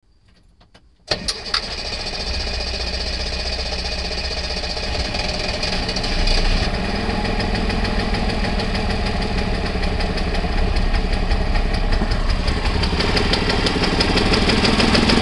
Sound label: start no fan, Subaru 360